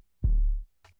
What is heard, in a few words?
KICK LOW